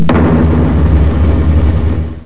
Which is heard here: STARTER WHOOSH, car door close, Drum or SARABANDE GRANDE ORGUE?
Drum